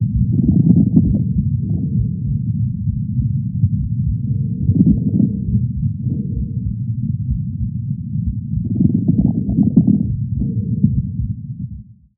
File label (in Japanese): 水泡音